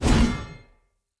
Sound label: Player Sphere Polearm Whoosh Heavy; Weapons